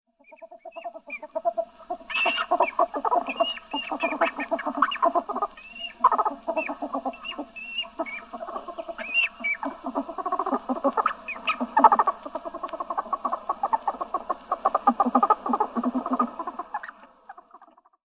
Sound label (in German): Iltis Mustela putorius